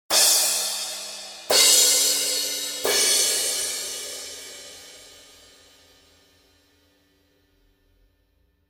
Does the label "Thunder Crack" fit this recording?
No